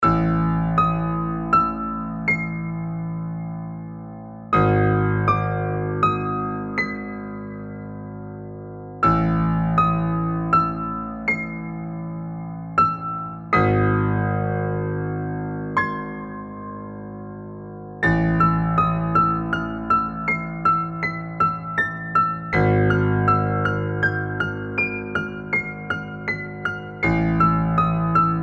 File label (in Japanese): ホラーな感じのピアノ; short